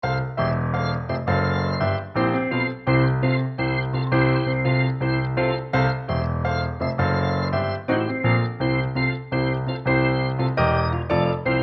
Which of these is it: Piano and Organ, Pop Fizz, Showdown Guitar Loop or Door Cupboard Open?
Piano and Organ